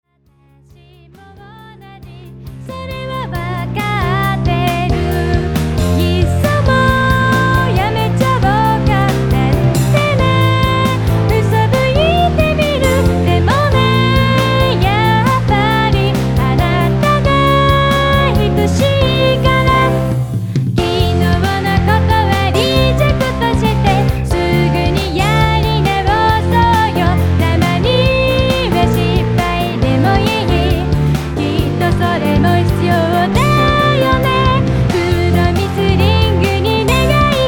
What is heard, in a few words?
retro pop girl